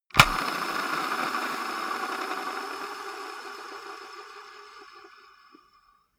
gaming mouse wheel scroll smooth hard

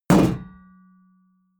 shield metal impact dent

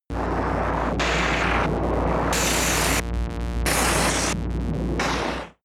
scatter vent buzz synth low

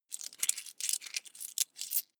coins clatter heavy long

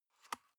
paper box small open soft

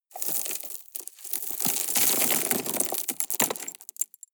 drop rock debris soft

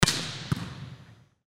volleyball hit hard

